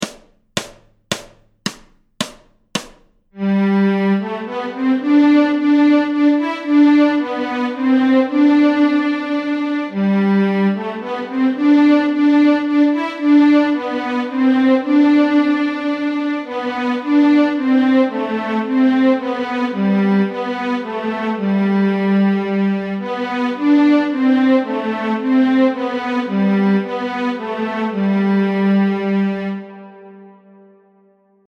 Mora långdans Stråk G, Fiol; Notträning

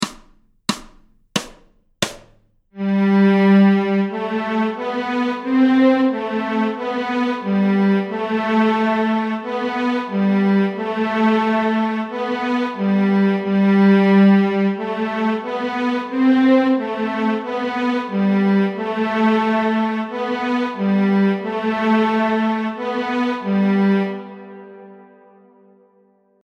Sirap Stråk G, nyckelharpa

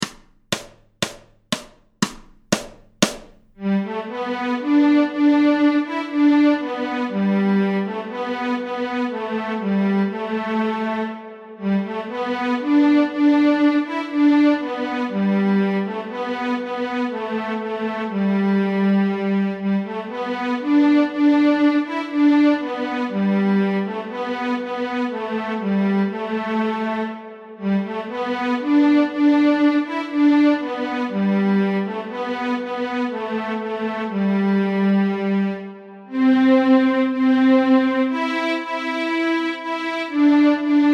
Stråk G, Nyckelharpa; Oh Susanna; Notträning

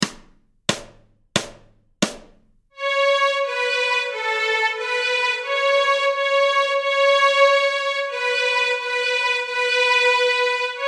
Stråk A, Nyckelharpa; A-strängen Steg 1 Två toner i dur

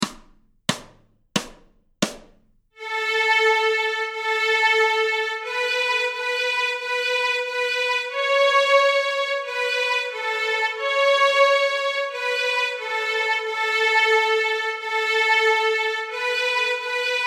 Neinaps Stråk A, Fiol

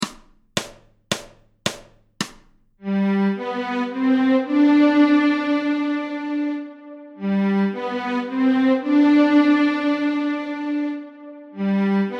Oh When the Saints Stråk G, Fiol; Notträning

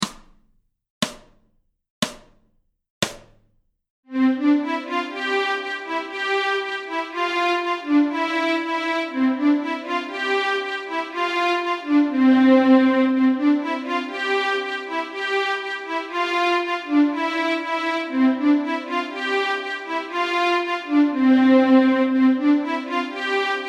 Polkalåt, nyckelharpa; Polkalåt nr 2 Stråk C

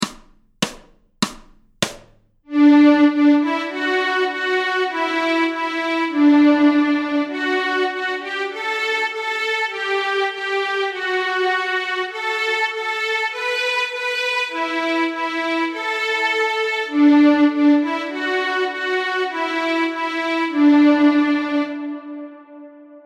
Tordenskiölds marsch Stråk D, nyckelharpa

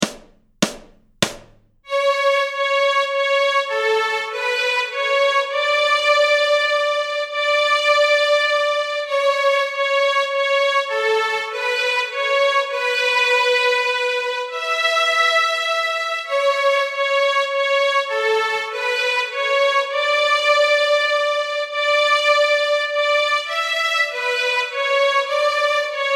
Beautiful Brown Eyes Stråk A, Fiol; Notträning

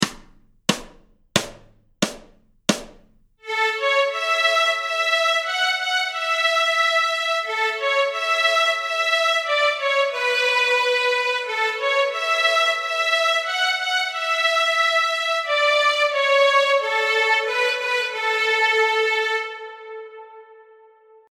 Stråk A, Fiol; Kumbayah

